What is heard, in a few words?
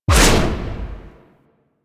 Melee Heavy Attack Short Whoosh; Warframe; Weapons; Whooshes